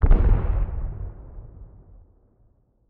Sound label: satchel charge explosion distant; Rust